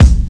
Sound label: original kick drum c key